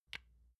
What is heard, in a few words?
lotion large lid close soft